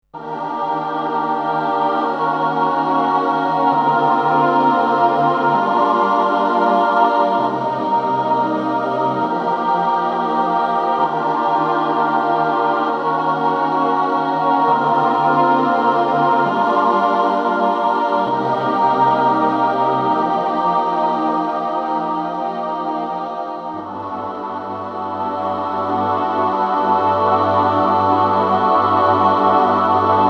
Boys Choir Tape; VIVO S10

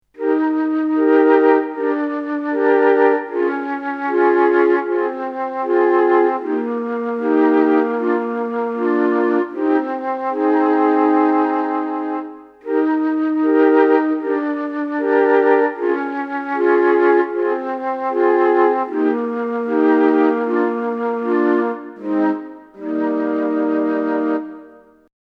Flute Tape; VIVO S10

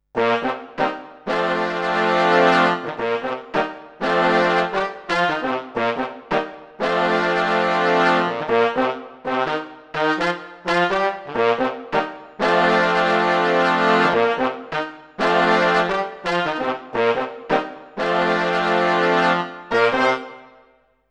Brass Tape; VIVO S10

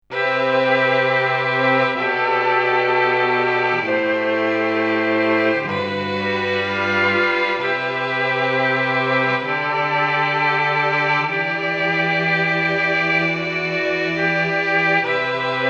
Strings Tape; VIVO S10

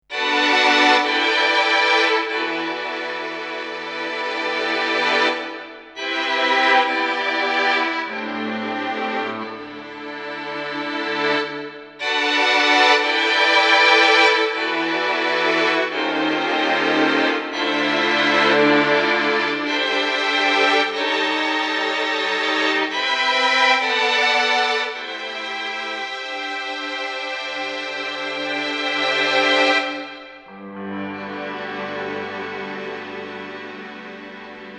Violins Tape; VIVO S10

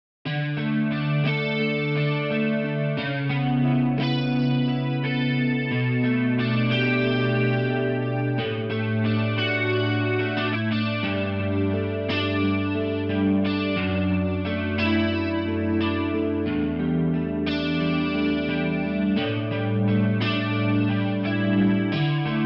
Electric GT; VIVO S10

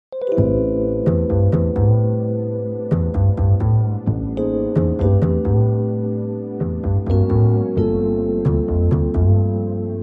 Ac Bass; VIVO S10

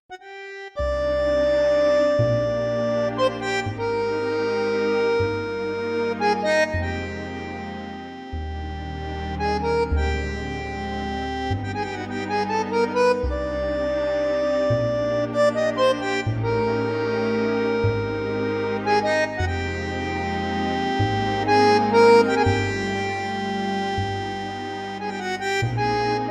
Bandoneon; VIVO S10

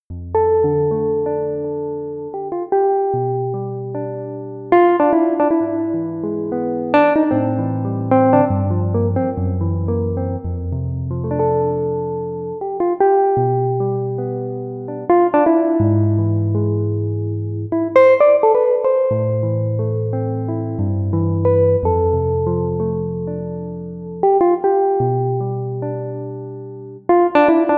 Jazz Guitar; VIVO H10

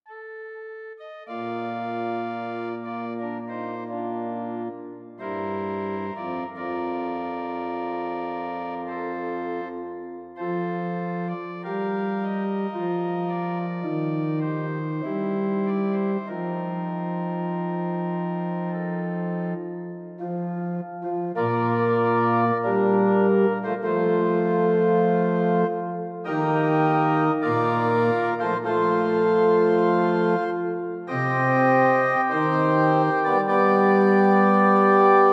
Symphonic; VIVO S10

